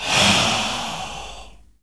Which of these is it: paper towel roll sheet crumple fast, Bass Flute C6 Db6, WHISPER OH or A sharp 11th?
WHISPER OH